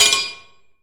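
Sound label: long sword; kult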